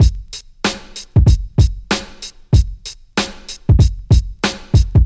95 bpm 2000s hip hop drum loop b key; drum loops

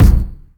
small reverb kick sample a key; kick drum samples

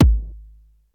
ambient kickdrum sound g sharp key

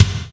short kick drum sound F sharp key; kick drum samples

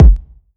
strong bottom end kick drum G key

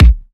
good kickdrum a sharp key; kick drum samples

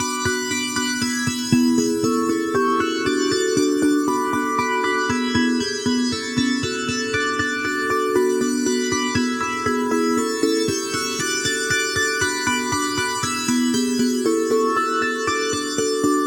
key pop 118 bpm; loops; sc essentials

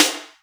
nice snare sample D# key

